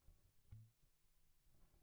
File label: Bass